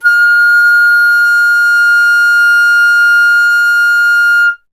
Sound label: FLT FLTF, Flute; Miroslav Vitous - Solo Instruments VOL-2; Solo lnst. II